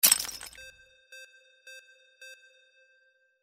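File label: impact ice break; windy beach audio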